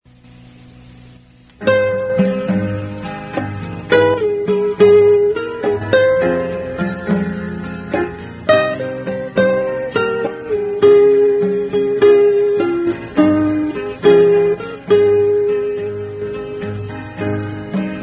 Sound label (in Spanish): instrumental; Oh buen Senor